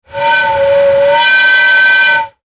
sound design air pipe pressure, Pressurized Air Release; Pressurized Air Or Steam Release From Pipe; Hisses